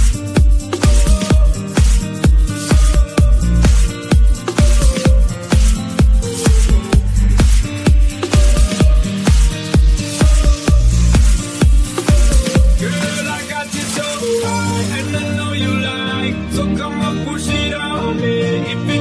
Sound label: Dance Music